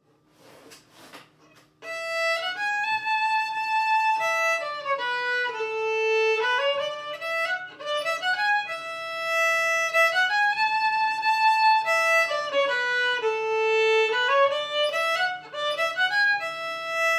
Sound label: Flygg Polska in A